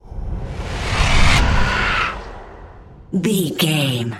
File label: dark wind growls